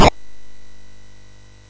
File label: Dragons Den, MOS6581R3